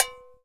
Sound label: button other; netent